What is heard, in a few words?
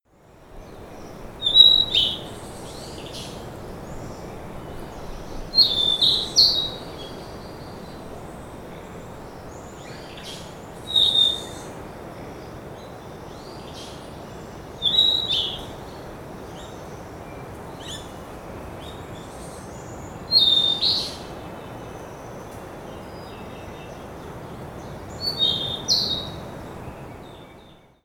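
Ryukyu Flycatcher, nakanoshima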